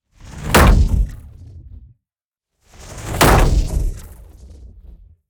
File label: Impacts; SoundBits; Whooshes & Impacts 2